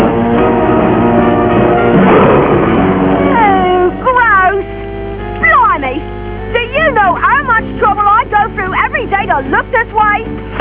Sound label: eww